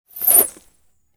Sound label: Whoosh-Bow Arrow Shoot, Magical, Surreal, Science Fict; Electa Quinney